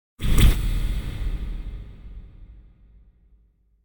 Impact Muted Hit Reverb; Hollywood Edge; sound designer's toolkit v2

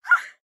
town human road hit; Blind Drive

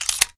Stapler Close; Office Supplies; 1001 Sound Effects

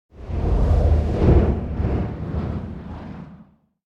Whoosh Air Rapid Movement Complex Whoosh; Hollywood Edge; sound designer's toolkit v2

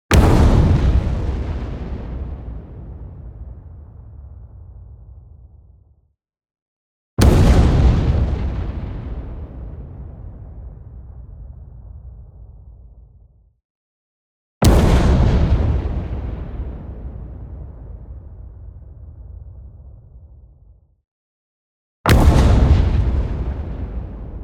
EXPLOSION LONG Bright Impact; boom library; Anime Essentials

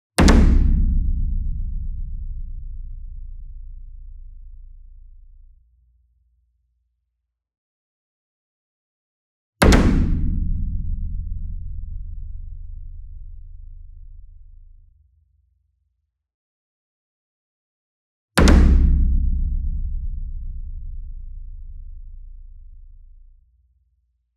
Gong Double Hard Beater Near; SINGLE DRUM; boom library; Cinematic Strikes Construction Kit

